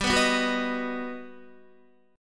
G Augmented Major 7th, Guitar

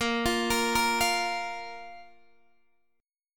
A sharp 5th A sharp Guitar DADGAD

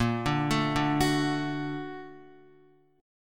Bb Augmented Major 7th down Guitar; Bb+M7 arpeggiated; JGuitar

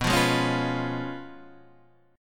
Db 7th Cb, guitar; Db7/Cb strummed

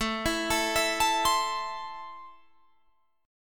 A Minor, Guitar, Open G

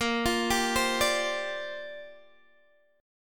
Bb 6th Add 9th Bb Guitar Open G

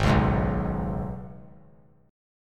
G sharp Minor Double Flat 5th G sharp; G#mbb5; strummed; JGuitar

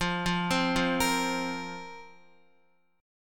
F Suspended 4th F, Guitar; Fsus4 arpeggiated

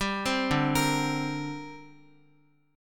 D 7th Suspended 4th Sharp 5th, D, down, Guitar; arpeggiated; 4E,4A,5D,5G,5C,6F; chord

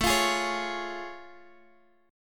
A Augmented Major 7th A, Guitar Standard; strummed; JGuitar

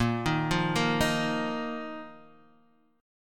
A sharp Suspended 4th A sharp

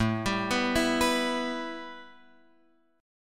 A 7th Suspended 2nd, A, down, Guitar, Open F; A7sus2 arpeggiated

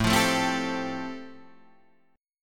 F Major Add 9th A

